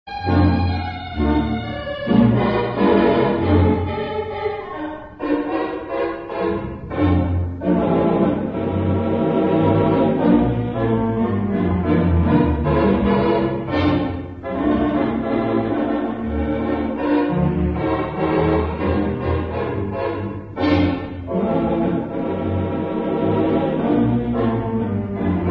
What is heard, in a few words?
theatre organ